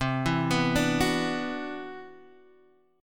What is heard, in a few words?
C Major Sharp 11th, Guitar Half Step Down; CM#11